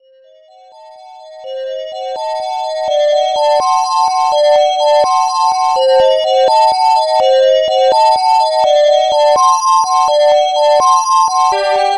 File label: waltz; da capo vol1